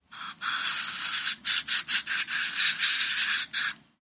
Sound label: Dental Air Blower, dentist